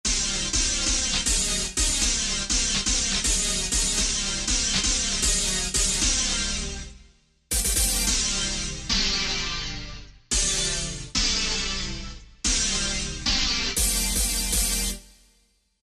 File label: Disonant Hit, Korg TR-Rack